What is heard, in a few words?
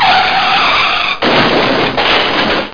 CRASH